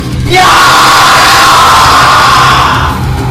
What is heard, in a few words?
aaaajaaaaaa